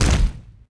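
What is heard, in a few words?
pot hit stone medium; objects; Star Wars Obi-Wan; XBOX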